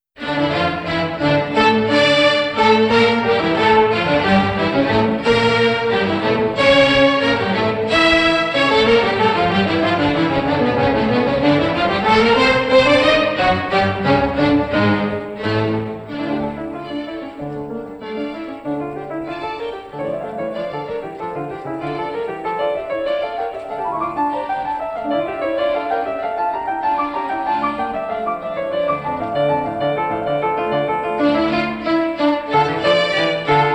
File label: Harpsichord Concerto In D Minor, Allegro (Piano Version); J S Bach